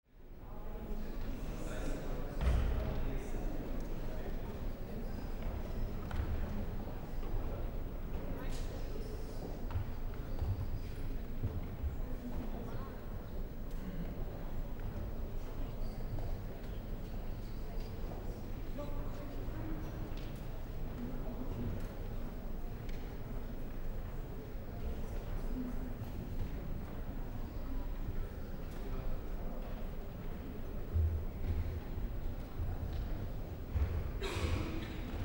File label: hall large atmo